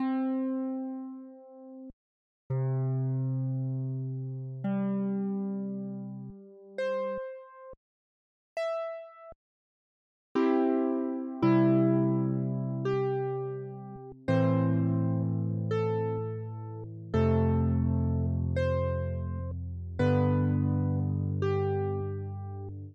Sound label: piano bright, piano sweep; voxel audio preview